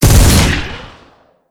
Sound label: player sniper